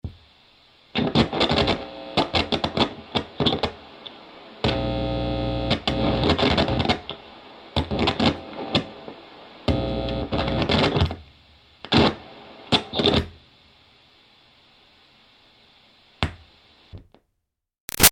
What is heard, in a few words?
Static Guitar Plug In-Out Dirty Buzzes; Static , Guitar Plug , In - Out , Interference , Buzzes , Dirty; Guitars; Musical